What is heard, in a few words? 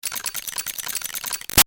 Gun Machine Metal Chain Sweetener; Machine Guns